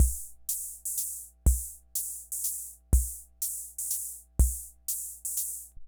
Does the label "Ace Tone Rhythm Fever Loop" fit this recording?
Yes